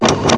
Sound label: servo; KABOOM! - Wild and Wacky Sound Software; industry